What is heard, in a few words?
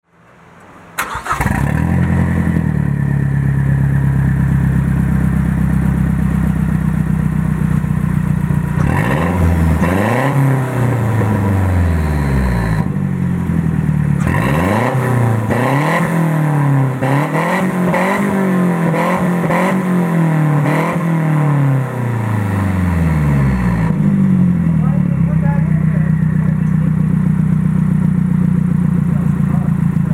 Open Downpipe, magnaflow; straight pipe